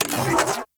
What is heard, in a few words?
Arch Gun Mode Switch Alt; Warframe; Weapons; Archwing; Hildryn